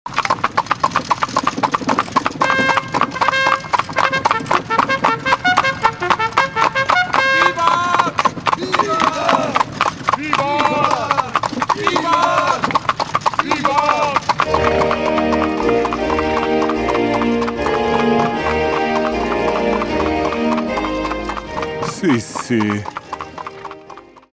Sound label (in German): Kutsche